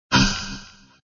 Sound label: small death; Bubble Tanks 2